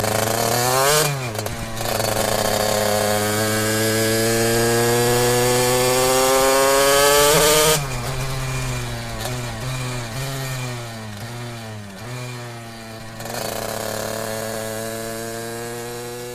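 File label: Yamaha RX100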